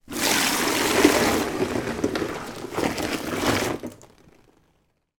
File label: household soapy water pour from bowl into kitchen sink